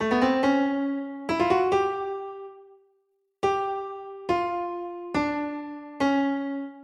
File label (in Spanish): Pianos Y Teclados